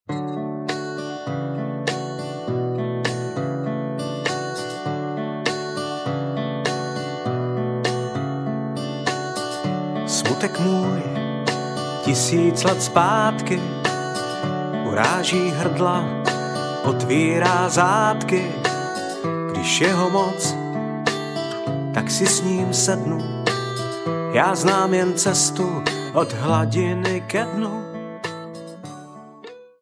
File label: sentiment blues